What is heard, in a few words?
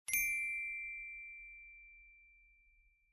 notif